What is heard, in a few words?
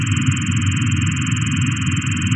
afterburner